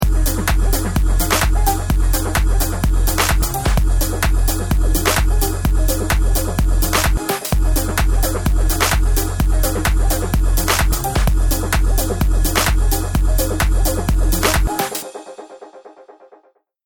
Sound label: start action, music